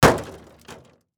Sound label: Impact Metal Bang